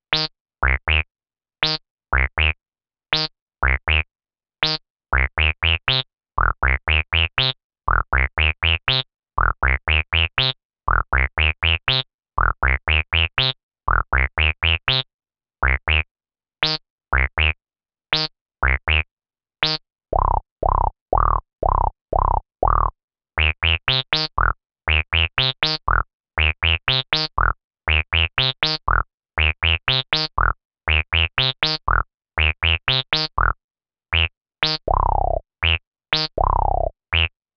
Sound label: Res Saw